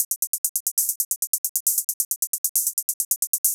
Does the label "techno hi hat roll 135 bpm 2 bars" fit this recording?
Yes